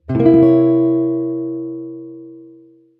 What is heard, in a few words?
c dom7b9, chord